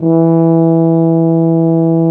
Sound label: tuba loop note